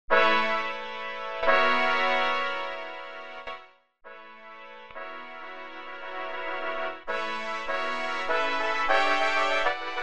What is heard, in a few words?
Fanfare midi